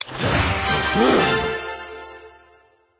ui coop

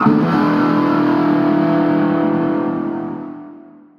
Defeat Stinger

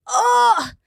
flyer death

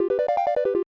Unused Jingle; bs wapaaz souryu hen; snes

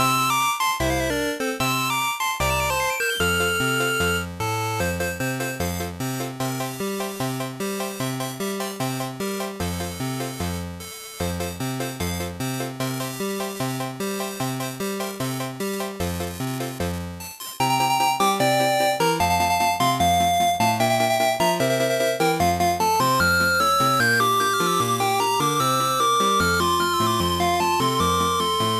Italian Folk Song; creative music system; ibm pc xt at

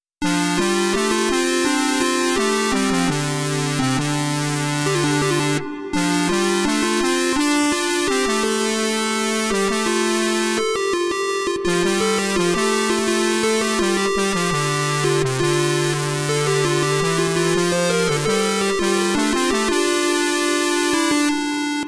Victory Song; bit dungeon plus; ps vita

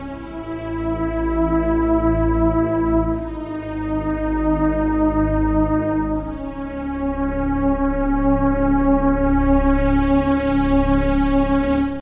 Creepy; Blockland

